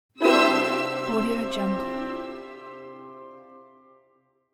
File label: correct; moonaudioproduction